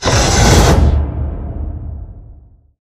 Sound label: sliding door lg verb open slide; beacon; Titanfall 2